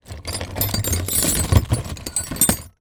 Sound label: industrial drill bits rummage plastic box; zapsplat